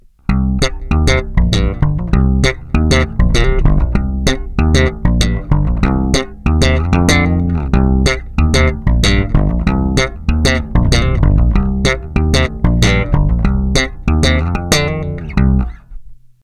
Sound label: Preamp slap bass